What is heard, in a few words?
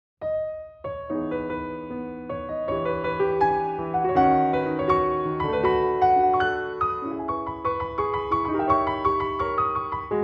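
piano version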